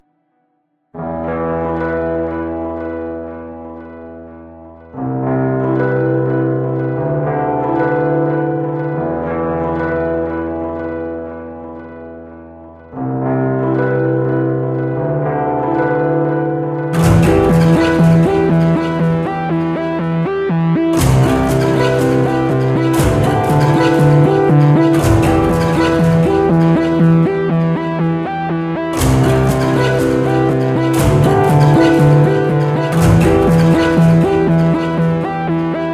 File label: mainmenu sci fi tune; Orange Moon; Windows